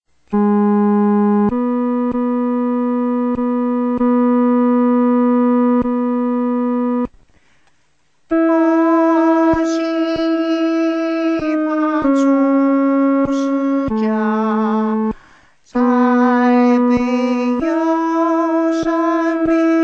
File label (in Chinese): 独唱 第二声; 近主十架